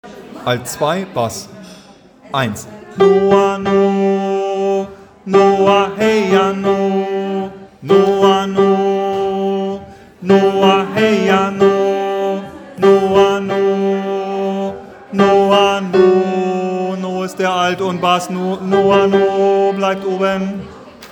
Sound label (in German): Alt 2 Bass